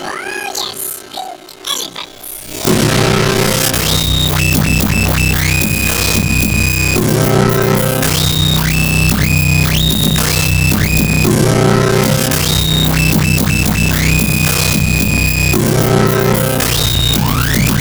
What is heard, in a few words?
pitchup Pink; Pitch Shift Up